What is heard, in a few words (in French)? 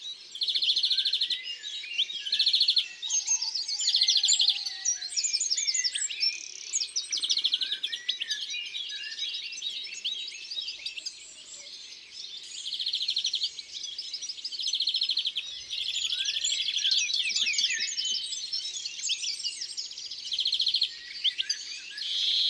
Verdier d'Europe, Chants d'oiseaux dans la campagne